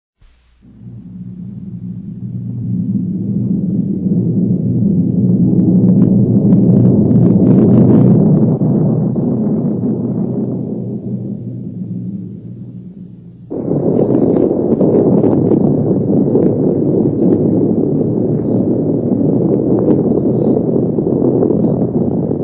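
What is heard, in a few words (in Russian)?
гул лавины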